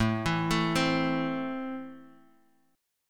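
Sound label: A Minor, chord strum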